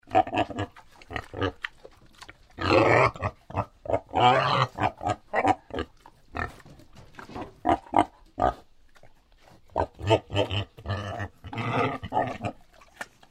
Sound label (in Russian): kaban jret